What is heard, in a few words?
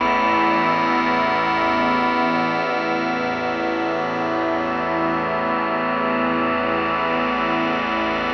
115 A Drone Cinematic; Zero-G - Future Wave; Drones